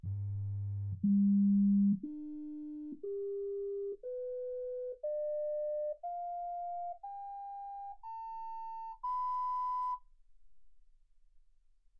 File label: sinus tones 48kHz